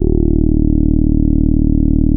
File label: TAURUS E2, synthesizer